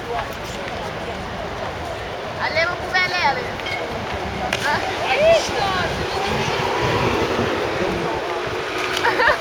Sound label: People jumping from the bridge; Sous-Terre bridge